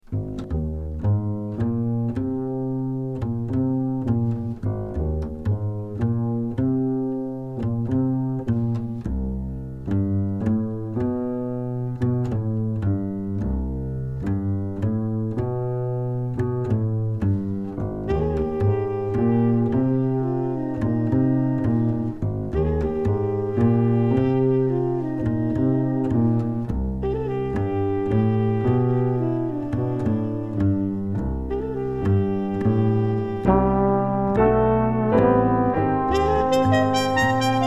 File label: minus Drums